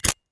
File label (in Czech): zapalovac; Mafie 1